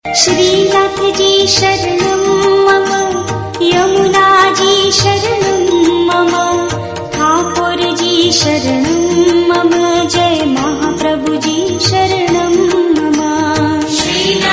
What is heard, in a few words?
Alarm